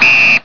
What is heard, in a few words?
whistle